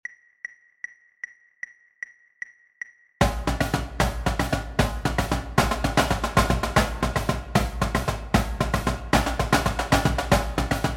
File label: sequence flam